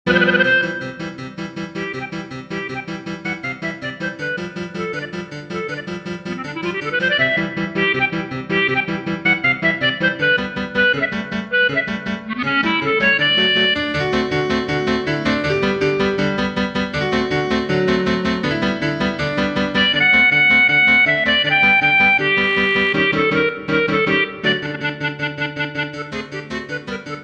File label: Polka; Tritsch-Tratsch-Polka